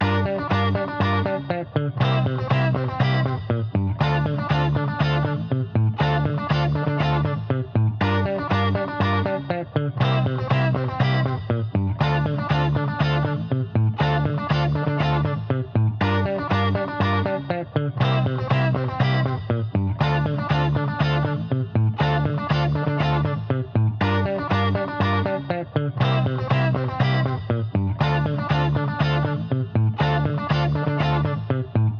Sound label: Disco Funk Loops Simple Mix Short Loop 120 Bpm